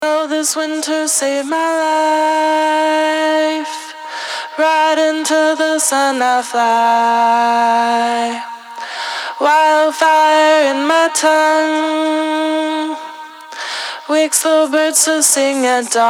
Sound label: vox OUT